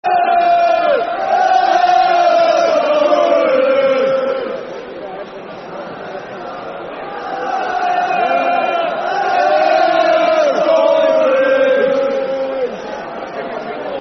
Na Na Na Nanan Na Sky Blues [Football Chant (Soccer Song)], Coventry; Coventry City Ccfc